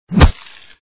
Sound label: creature attack; Necronomicon Book of Dead Names